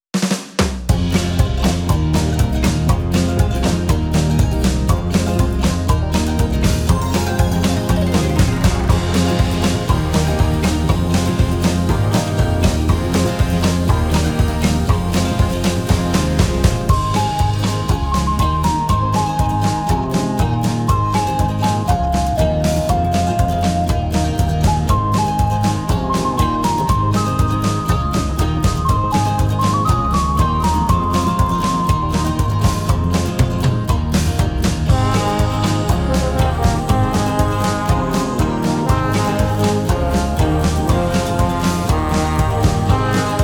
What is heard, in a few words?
Polka